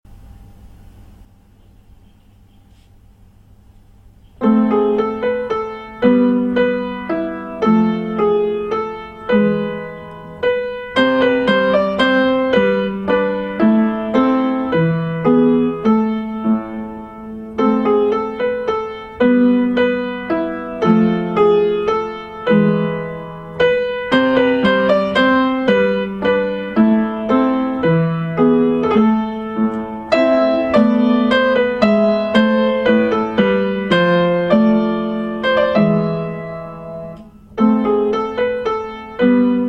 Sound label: Minuet in a minor; Purcell